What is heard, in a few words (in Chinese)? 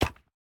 树脂砖落地声